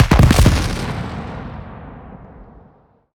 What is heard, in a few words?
objects, World of Tanks